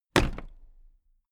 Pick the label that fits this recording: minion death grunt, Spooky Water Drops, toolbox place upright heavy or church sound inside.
toolbox place upright heavy